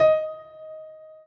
piano

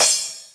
cymbal

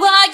vocal

